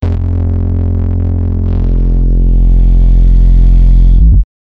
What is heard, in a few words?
a rich full bass sound